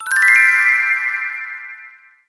Connection made; HP iPAQ Glisten

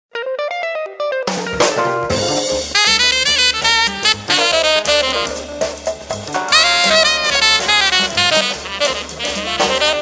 Jazz bar; Samsung GT-S5560-China

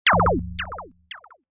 Shoot

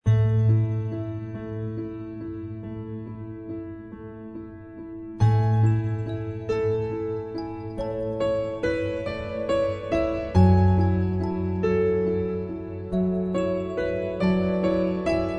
Alarm Snow Background, 天气动态闹钟dynamic alarm; Xiaomi MIUI 12.5 China; 雪Snow; MIUI12.5系统音效全收录

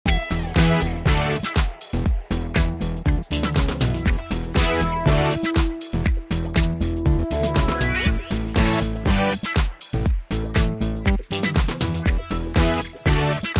Joyful Steps, ringtones Retro; Samsung Galaxy S24

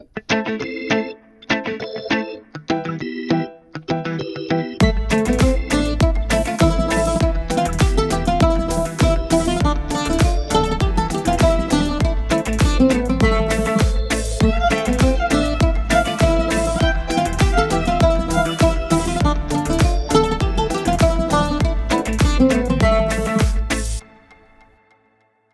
Reggae, ringtones; Tecno Phantom V Fold

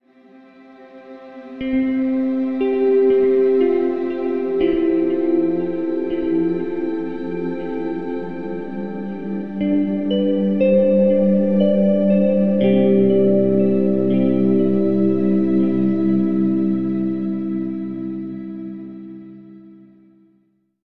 weather alarm cloud; OnePlus; ui; OnePlus 11

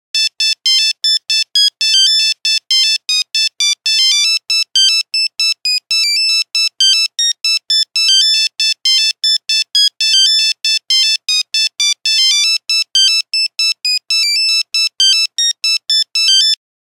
Nokia Buzzer; Windows Phone apps

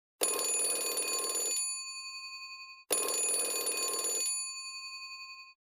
Phone Bell; KONKA; ringtones; SP6

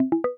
Charging Started; Lenovo; ZUI-12

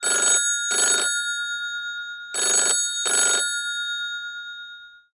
Classic bell

